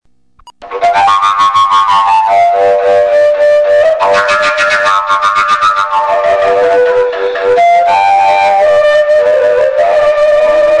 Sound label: fujara; oscadnica